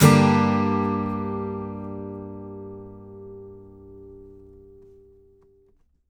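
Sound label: acoustic guitar G minor down, left; Country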